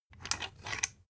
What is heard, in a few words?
thread screw remove